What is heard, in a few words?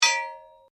Blade Heavy Moving Impact Ring, Sword; Foley sounds for melee weapons; Swords; Combat